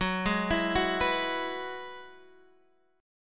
F sharp 7th Suspended 2nd Suspended 4th, Guitar; x,10,7,10,8,8; Half Step Down

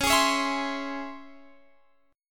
C sharp Minor Major 7th C sharp Guitar Open F; C#mM7; strummed

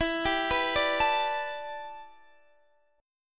E Minor 11th guitar chord, Open D

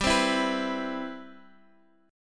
C Major 7th, G, guitar chord

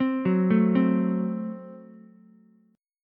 Gb Diminished, Ukulele, Gbdim chord; arpeggiated; JGuitar

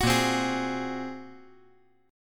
Bb 7th Suspended 2nd Sharp 5th Bb; Bb7sus2#5; strummed; 11,10,8,11; JGuitar

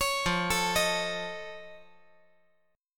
F Augmented 7th F, chord

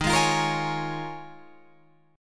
Eb 9th Suspended 4th, Guitar, Open F; Eb9sus4; strummed

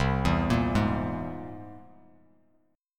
C Augmented 7th, Bass Standard, chord; arpeggiated; 8,7,8,1; JGuitar

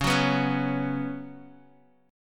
B Suspended 2nd Db

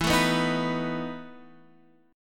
E Major 7th Suspended 4th E down Guitar Drop D

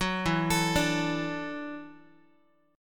E 7th Suspended 2nd Suspended 4th, down, Guitar; E7sus2sus4; arpeggiated

